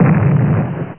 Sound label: Op Fire; dasboot